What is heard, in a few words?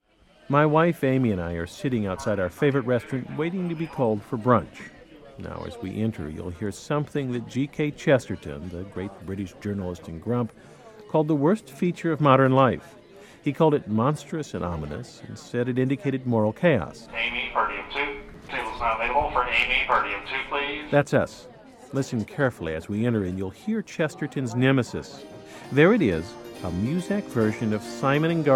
muzak